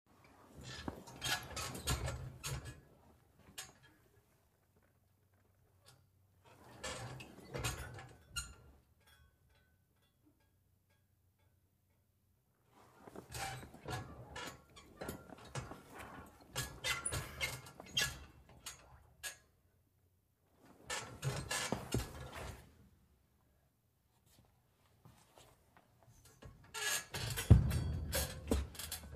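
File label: Barracks Misc Bunk Bed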